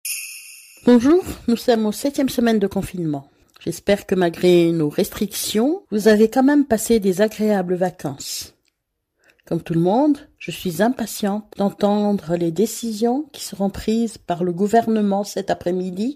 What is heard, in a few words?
pad